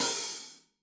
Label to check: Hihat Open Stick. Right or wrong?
Right